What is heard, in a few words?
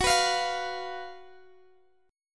Fsharp Major 7th Suspended 4th Fsharp, Guitar DADGAD; chordshape; down